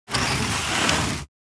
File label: Light furniture sliding on floor moving